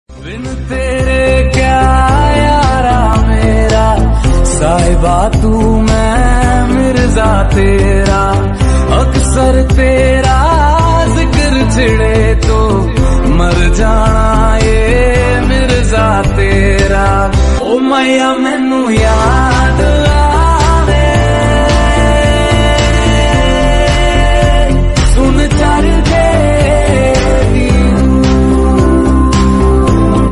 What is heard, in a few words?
romantic ringtone